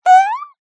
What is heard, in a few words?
cartoon voice high pitched cute shy short; zapsplat